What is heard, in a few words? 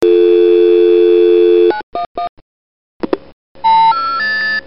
Dial Tone